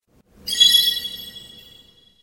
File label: chime; raising heart; nanoha